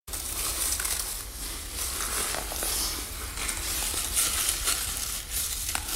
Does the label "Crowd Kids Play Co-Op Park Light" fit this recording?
No